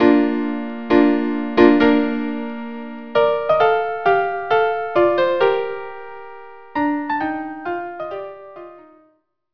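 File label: March violin violin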